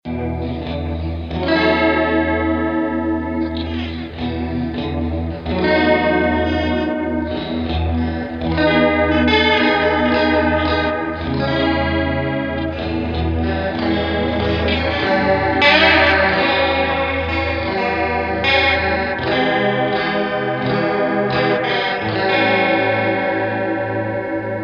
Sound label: Jingle Bells